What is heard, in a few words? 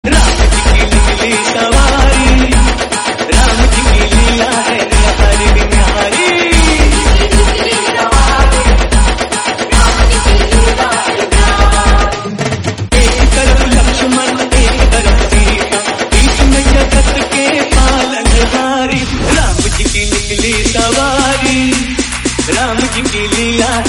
Dj Remix Hindi Song; Lord Ram